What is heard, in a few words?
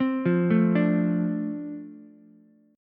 F 6th F down Ukulele; F6 arpeggiated